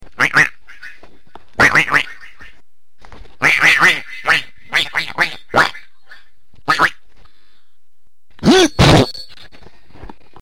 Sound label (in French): Canard